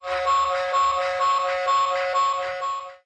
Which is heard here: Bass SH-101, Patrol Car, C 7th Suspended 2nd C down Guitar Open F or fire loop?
Patrol Car